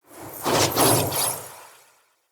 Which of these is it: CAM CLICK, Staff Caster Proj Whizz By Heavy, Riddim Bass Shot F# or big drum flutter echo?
Staff Caster Proj Whizz By Heavy